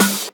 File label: Open Hihat, Cymbals; Vengeance Samples